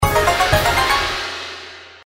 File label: double win; Princess Wencheng